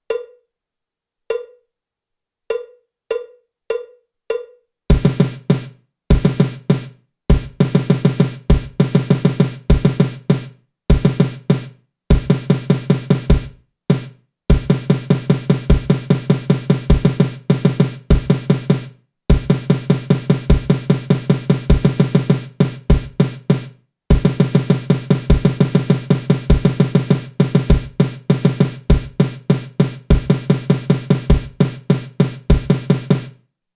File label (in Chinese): 架子鼓独奏 热身独奏; 66条架子鼓独奏SOLO